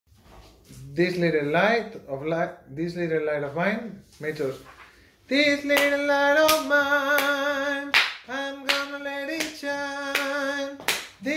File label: This Little light of mine mezzos